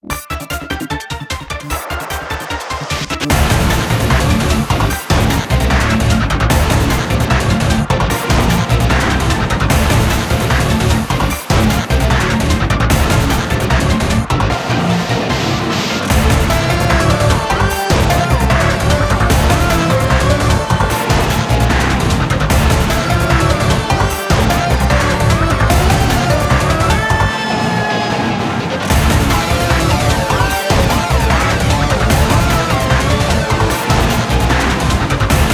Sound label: Boss Battle; Fire Emblem Heroes; Infinite Scale